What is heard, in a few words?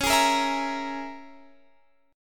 C sharp Minor 6th, Guitar Open F; C#m6 strummed